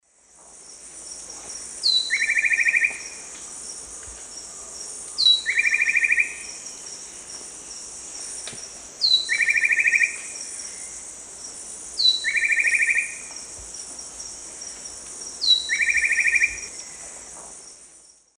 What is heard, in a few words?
Henicorhina leucosticta, song; S. America; Eastern Lowlands